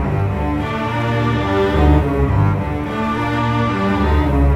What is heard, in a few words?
Bass, Cello Viola, Cinematic; Sonic Foundry (Sony Creative Software); Orchestral Series VOL-3; Cinematic 11